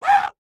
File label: Alert; Going Under; Joblin